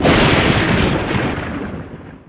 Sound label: thunder; bracken